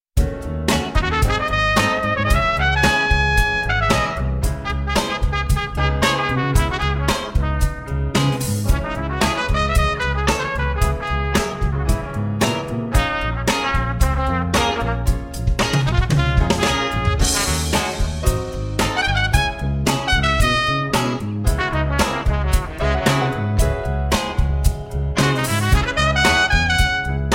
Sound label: Avant Garde Jazz